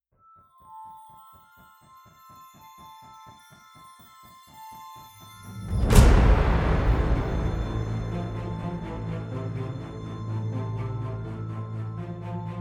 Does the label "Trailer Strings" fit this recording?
Yes